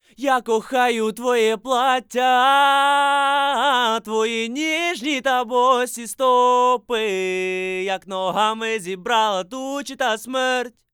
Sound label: antelope zen go vocal